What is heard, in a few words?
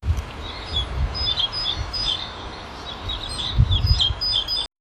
soft sad chirps and whimp